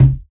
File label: Bucket, household rhythm samples Hits